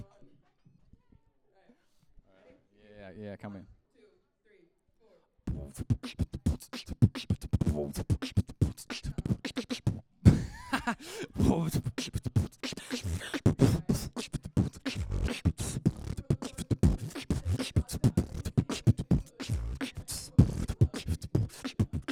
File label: Beatboxing